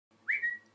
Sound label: Wistle